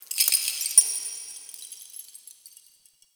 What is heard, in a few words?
Metal Debris; Warframe; The New War; Zariman